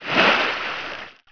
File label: Water Exit; Player; Heretic II Demo